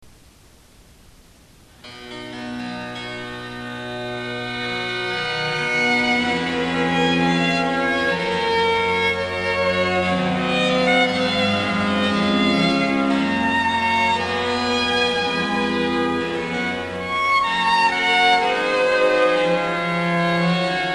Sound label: adagio